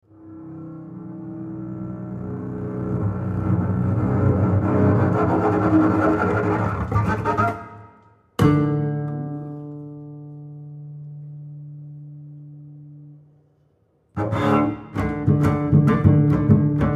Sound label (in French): Contrebasse solo; Cycle des siecles